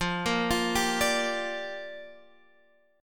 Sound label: Bb 6th F